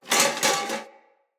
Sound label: Foley Armour Body Fall B; Enemy; Mockery